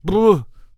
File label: Death Vocals